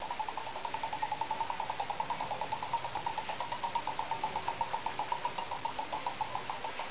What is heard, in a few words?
wheel; Image Bonanza